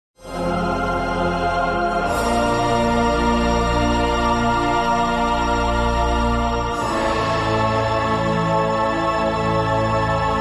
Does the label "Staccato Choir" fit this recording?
Yes